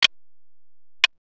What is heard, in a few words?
clock ticking; BedRoom